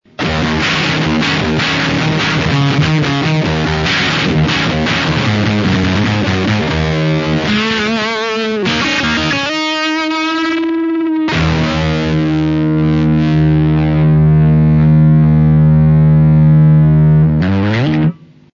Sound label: vutron filt